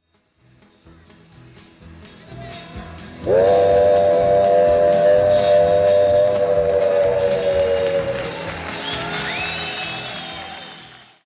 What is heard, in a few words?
old train songs medley